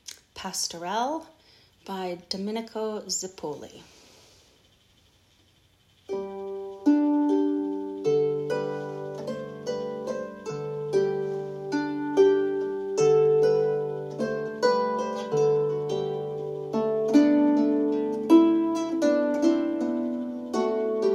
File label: gothic harp